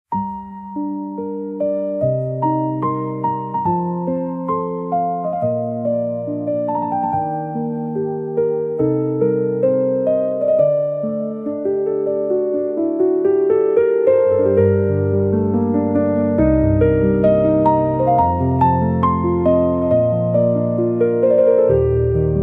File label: calm piano